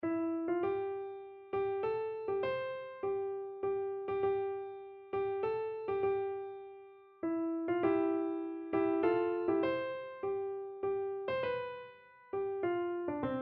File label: i will sing, keyboard